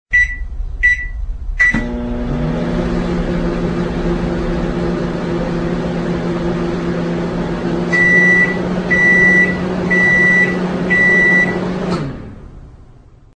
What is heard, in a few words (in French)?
bruit d'un micro ondes